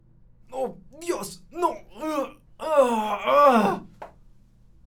Gritos hombre

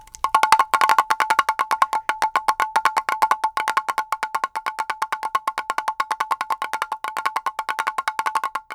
Tambor

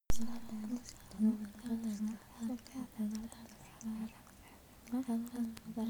Murmullos

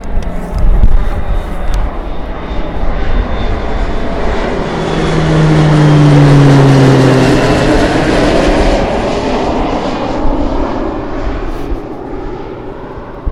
Avion De Elices

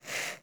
Smoke deslizandose por la tierra

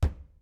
Golpe Mesa

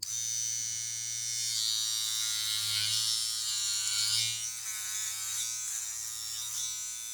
Rasuradora

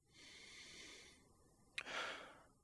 Suspiro

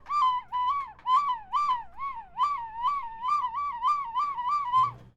Gaviotas

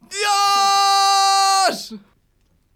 Grito Hombre Dios

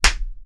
Cachetada

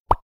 Rebate tiro

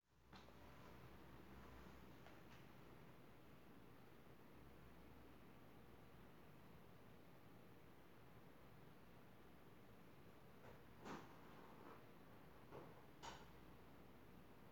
Ambiente Casa

